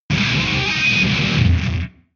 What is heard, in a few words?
Scissor Kick; Leech Scissor Kick